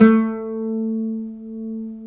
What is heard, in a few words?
LUTH 2 A3, Arabian Traditions; USB Soundscan vol.43; AKAI